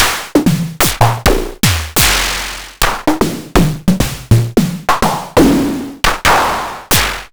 ms20 raw snares

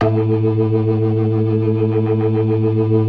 DRAWBAR VIB, Synth and Organ; InVision Interactive; Keith Emerson; 4DRAWBAR+VIB

